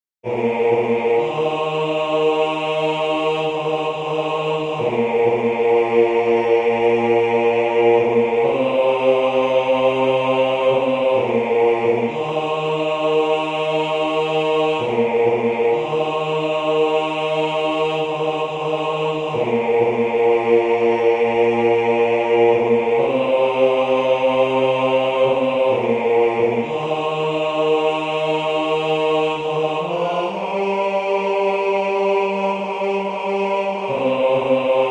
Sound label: SATB Bass; Einzelstimmen